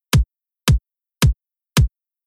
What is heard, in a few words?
Audio Edit in XF Kick